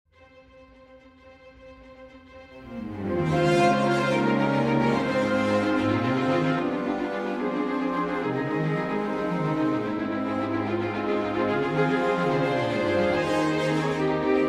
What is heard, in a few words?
winds strings